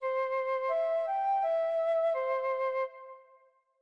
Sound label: Flute - Melody